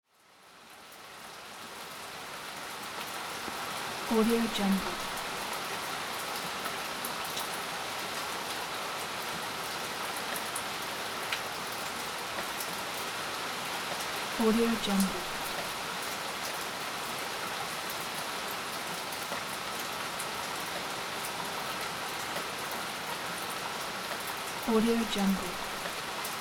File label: rain